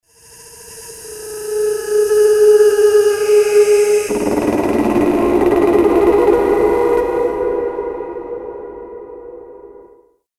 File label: fantasy creature